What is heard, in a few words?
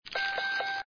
Game Start